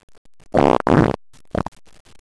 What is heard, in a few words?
fart